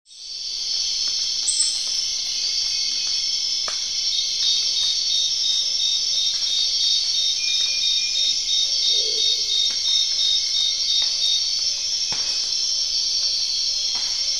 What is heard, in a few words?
forest; Submachine 2